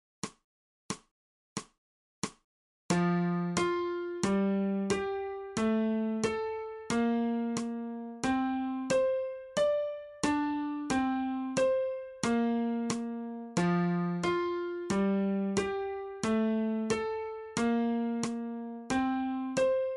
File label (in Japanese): 新フレットレス